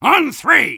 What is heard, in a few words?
taunt exert; Soldier; rps